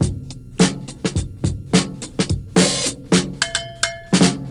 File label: 86 bpm breakbeat c key